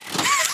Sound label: hand retract; Extendo Hands